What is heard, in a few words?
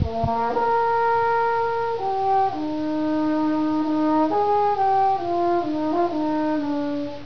french horn